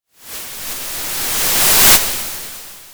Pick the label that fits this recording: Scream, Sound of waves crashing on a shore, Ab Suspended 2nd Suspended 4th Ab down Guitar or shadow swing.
Sound of waves crashing on a shore